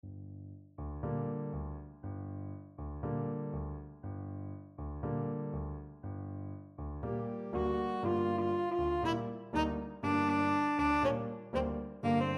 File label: tenor saxophone